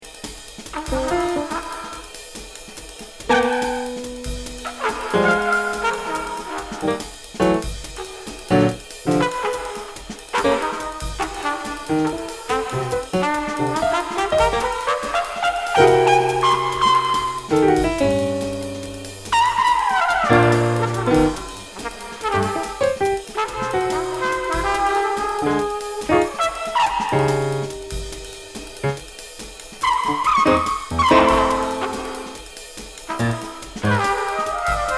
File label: trumpet